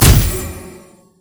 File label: impale; LoL; skarner